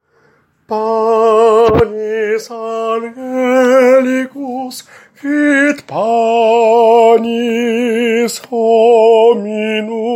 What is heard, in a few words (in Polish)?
SOPRAN; Panis angelicus